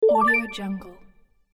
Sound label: bright positive retro video game confirmation menu sound